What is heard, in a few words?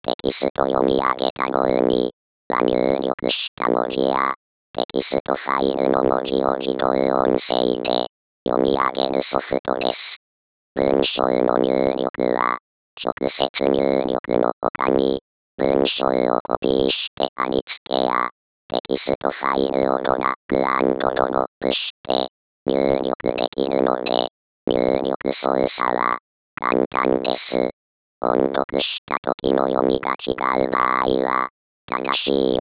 robot